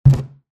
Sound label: CONTAINER PLASTIC